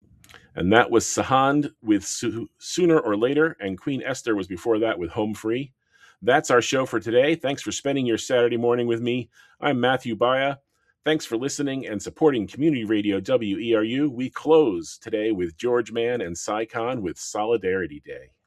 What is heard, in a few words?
Break